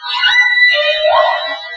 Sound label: Animal sound; The Ridge 1.1